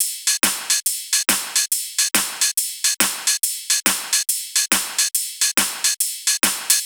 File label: drum Loop 140BPM Full Perc; Vengeance - Essential Clubsounds Vol.5; VEC5 Drumloops; VEC5 Multi Loops 140BPM